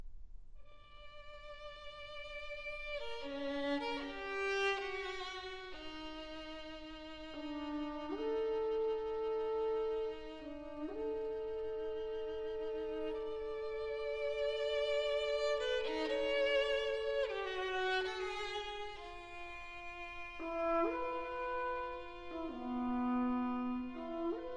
Twilight Music For Horn, Violin and Piano - Con moto flessible